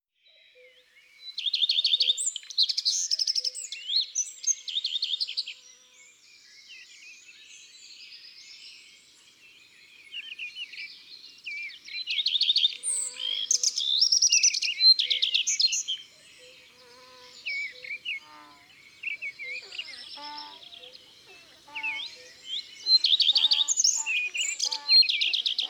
Rougequeue a front blanc, Chants d oiseaux dans la campagne

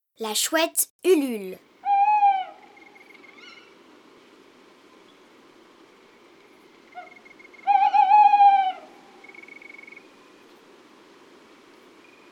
La chouette; mon imagier sonore de la nature

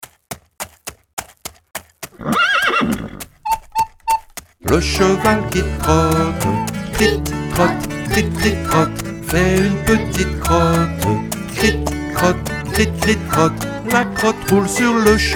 Chanson

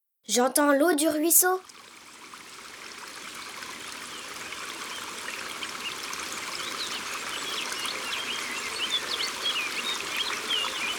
Le ruisseau

